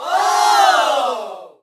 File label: reaction